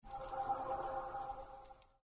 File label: task updated info sound